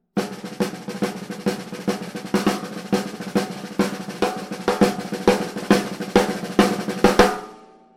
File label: coated solo, drumline